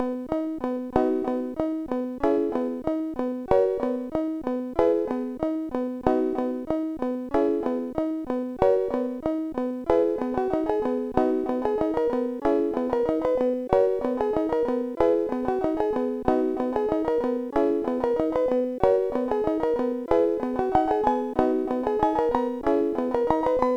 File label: Piano Experiment, CSG8580R5; hvsc; Madaco